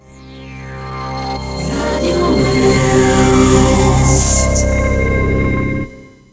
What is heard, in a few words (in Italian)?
jingle slow